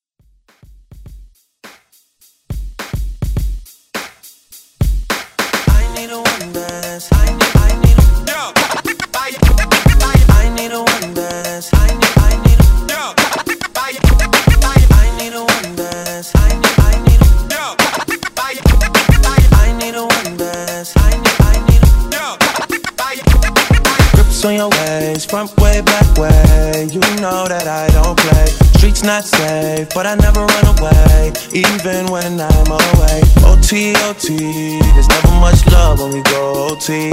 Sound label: Flip Scratch Redrum